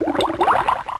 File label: Aqua Ring; pokemon reborn e19 2022